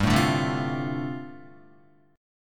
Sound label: G 7th Suspended 2nd Suspended 4th G, Guitar; JGuitar; strummed